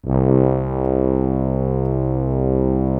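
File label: BRASS C1 right, ORCH BRASS; Greytsounds VOL-1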